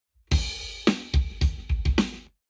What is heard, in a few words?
Lab Wet KEMAR Ear Drums 0deg